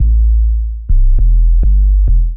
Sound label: 3 OP Boom groove 101 bpm; MIDI Clips; Ableton; Factory Packs; Beat Tools